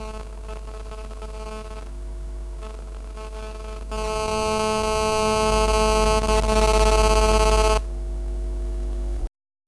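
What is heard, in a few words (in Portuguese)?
hum hummmm tipo vibração de, mobile phone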